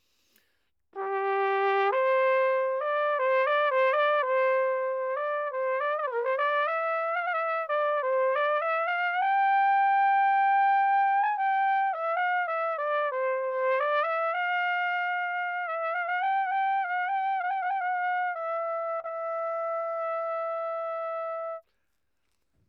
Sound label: anechoic natural baroque trumpet; 1780; OPENAIR